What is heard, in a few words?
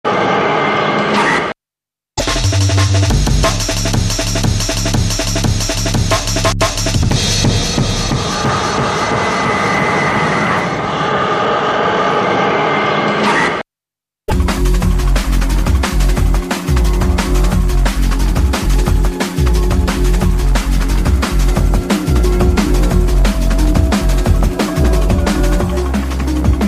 Drum and Bass Jungle, UK Bass Radio